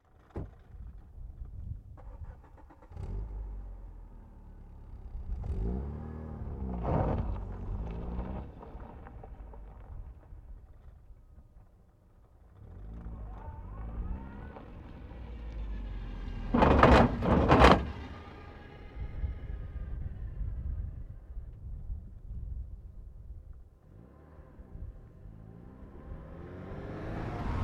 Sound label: east guesthouse; Ristarhlid; Berufjoerdur